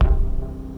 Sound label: Tone Tom Synth; Lost Tapes From Mars